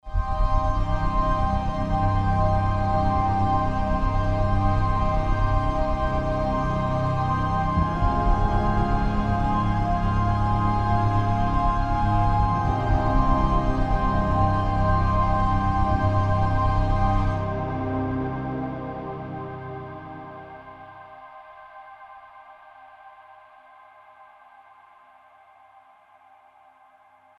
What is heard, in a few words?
Pad A Flight through Space; Elysion 2 Orion